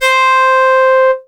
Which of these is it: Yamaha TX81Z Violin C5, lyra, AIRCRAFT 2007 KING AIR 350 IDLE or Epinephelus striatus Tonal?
Yamaha TX81Z Violin C5